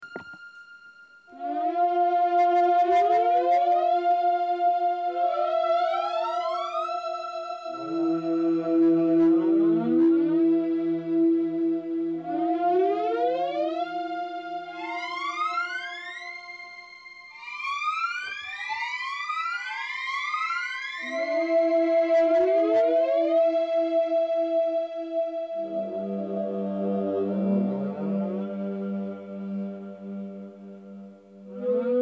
Keyboard Synth (Casio)

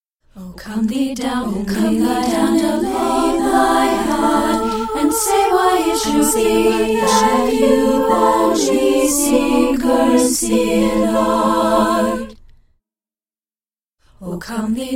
Chorale; Oh Come Thee Down

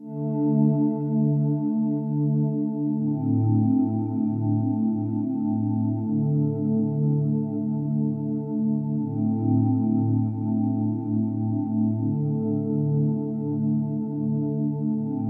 ambient Pad

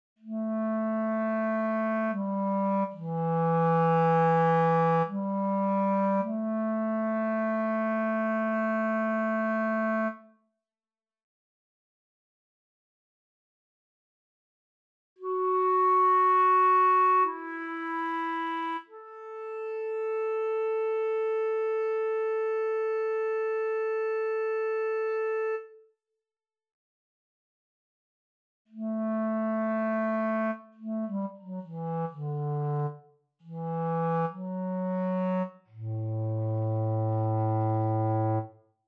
Clarinet

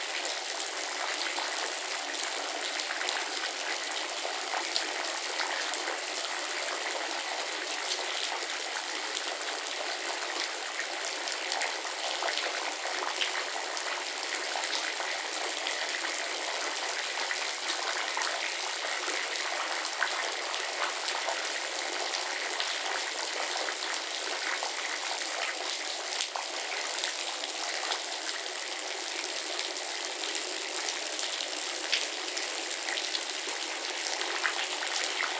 Cave Waterfall Field Recording

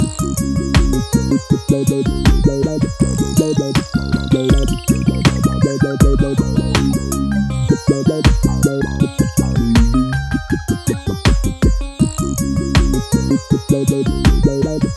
Thunder Rain Inst. Construction Kits

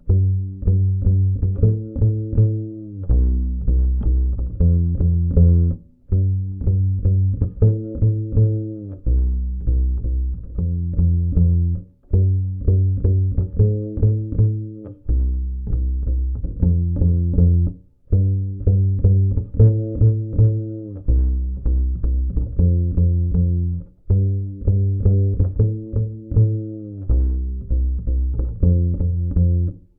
bass part

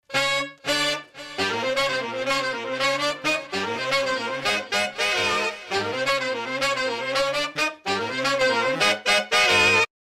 brass loop

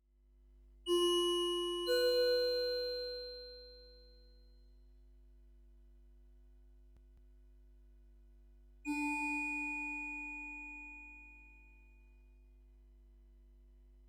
Spacey dream 60bpm Cosmic

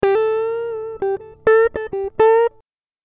Em 100 GTR Riffs

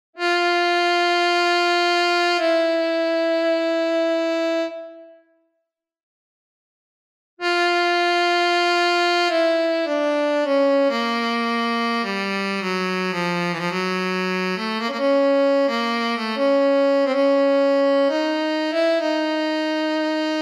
Sax Improvisation

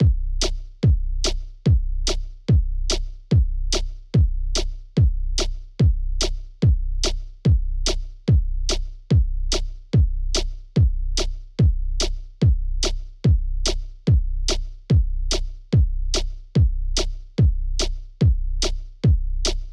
beat

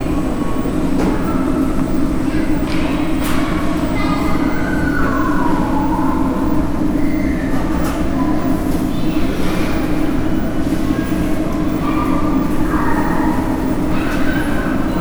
mall food court

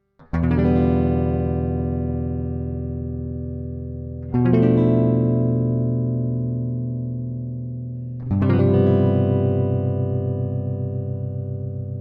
112 BorderTown gtr combo dry

